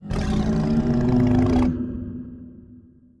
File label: CRAWLER BUNCH